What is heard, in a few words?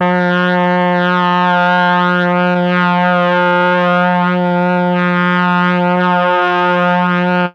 MEL BRASS; ILIO; Propeller Island; Legendary M400